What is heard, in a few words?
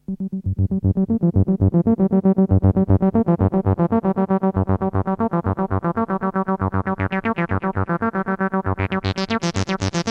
303 tri; tb303; synth